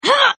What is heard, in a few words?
hit; april; Power Rangers vs Teenage Mutant Ninja Turtles - Ultimate Hero Clash 2